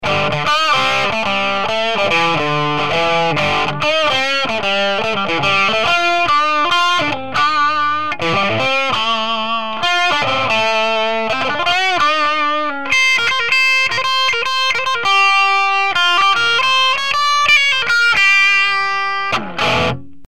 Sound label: rocket blues; Сравнение динамиков от Celestion; recto